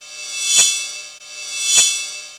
ride, drums; CMP - Analogon

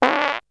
Beatbox; ZERO G - Datafile DISC 3

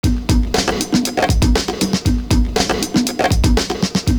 GROOVE, loop; Univers Sons - Kitch 70s

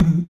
HMM, Human Perc, Body Perc; Spectrasonics; Supreme Beats; World Dance

